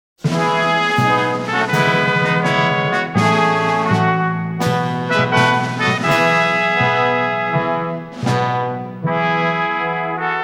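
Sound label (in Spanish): Metales y percusion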